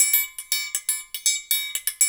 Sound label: 120 Triangle; Tri.Again